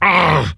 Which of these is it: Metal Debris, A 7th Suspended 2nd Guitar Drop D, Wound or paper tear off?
Wound